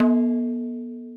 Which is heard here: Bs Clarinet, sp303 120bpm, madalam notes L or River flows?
madalam notes L